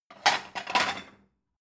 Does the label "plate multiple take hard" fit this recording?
Yes